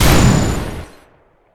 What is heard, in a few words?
Destroyer Charge Impact; Terraria